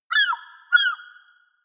seagull call squawk; natur-tiere; voegel

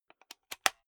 insert safety plug springy; objekte; elektronik

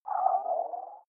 game start dull

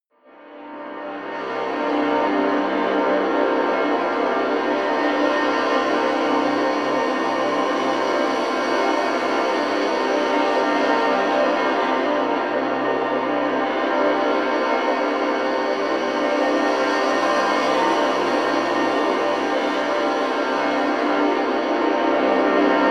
drone five strings

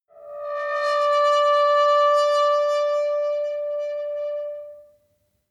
metal squeal saturated tonal; industrie-wirtschaft

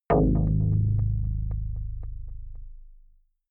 synth hit drop echo deep

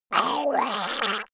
monster gurgling high; kreaturen

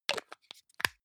hygiene small screw cup close hard; haus-garten; badezimmer

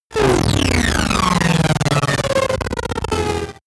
break down; energie

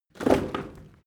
rotten wood drop; berufe; foerster

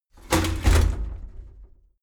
shower slide door inside close heavy halfway

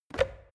plastic water boiler lid off quick; maschinen; wasserkocher; soundpool

